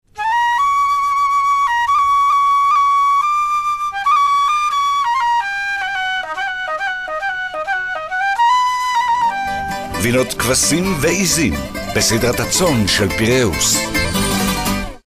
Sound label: Flute Goat